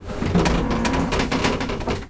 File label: Swamp Boat; Levels Hub Swamp; Hub Swamp Boat Groan